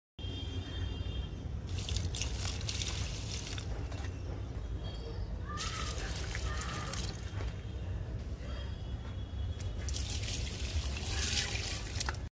Watering potted plant; اب دادن به گلدان

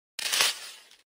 Opening paper roll

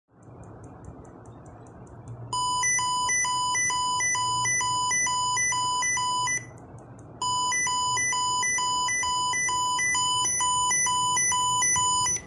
beep electronic devices

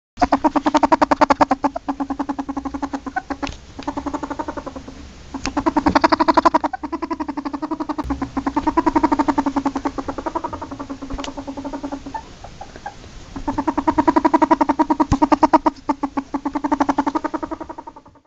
marbled polecat

